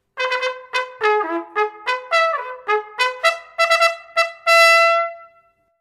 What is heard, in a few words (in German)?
Wachtmeister-Ruf, Kavallerie